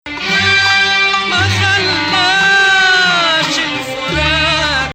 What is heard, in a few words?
Hijaz